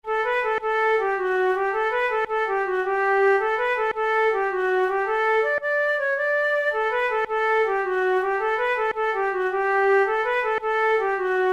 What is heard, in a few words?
jig, flute